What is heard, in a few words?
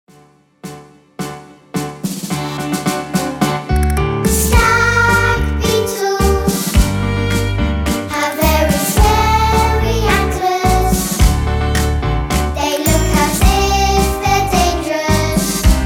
Stag Beetle Song